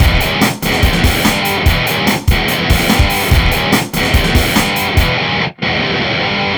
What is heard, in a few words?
Hardcore Breakbeat, 145 Emin; Big Fish Audio; Hardcore Breakbeat Action; Breakneck